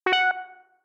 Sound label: Positive Beeps